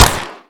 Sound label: Mouth Gun